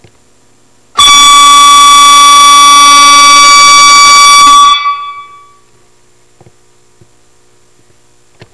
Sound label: horn, Victory